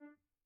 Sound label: Grand Piano, Kawai, rel D3; Chordophones; Releases